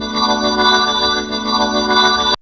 ORG CHD; E-Lab; X Static Goldmine 2; akai

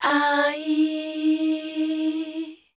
MEL D#, Voice Spectral

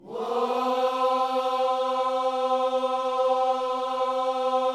"Whoa", Gospel vocal, C4; Spectrasonics; Vocal Planet Vol.1 Gospel; akai

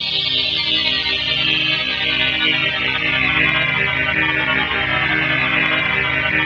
PHASE PAD, ATMO PADS; Best Service; XX Large

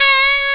SAX TON; E-Lab; X Static Goldmine 2; akai

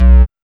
BASS; E-Lab; X Static Goldmine 1

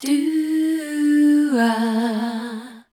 MEL D#, Voice Spectral II, MELODIC; Best Service

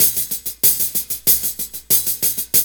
LOOP; E-Lab; X Static Goldmine 2

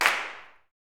CLAPS, Gospel; SNAP; Spectrasonics; Vocal Planet Vol.1 Gospel; akai

